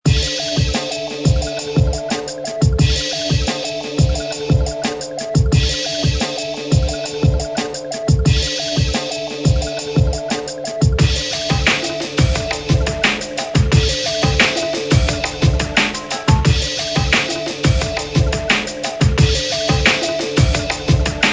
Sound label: Melody, RingTune